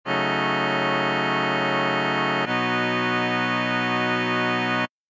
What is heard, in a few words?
chord prog; monz; telemann